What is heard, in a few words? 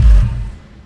hudson off; Mafie 1